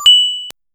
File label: coin pickup sfx